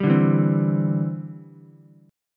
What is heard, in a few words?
D 7th Suspended 2nd Suspended 4th D, Ukulele; D7sus2sus4 strummed